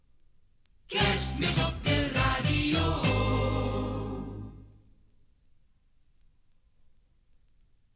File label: topformat maxi jingle single; topformat maxi jingle single4